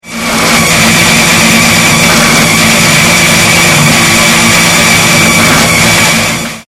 Falzmaschine

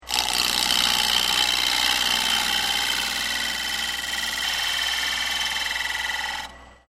Drechsler

